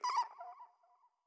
Bat Death

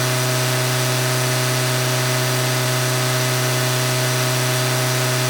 Engine Kart Rpm High; kart racing; Boom Karts; Android

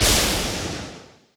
Lightningstrike

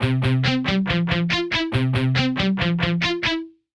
Rockstar Loop

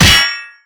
Enemy Metal Damagehit; gamerip; disney star smash; android

